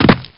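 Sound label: SFX land dirt; blood fresh supply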